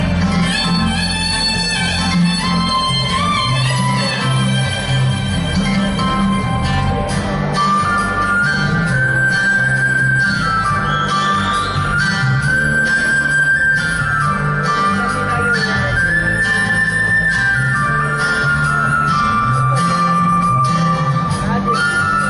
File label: Love Tone